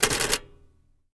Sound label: Typewriter Back Spa; typewriters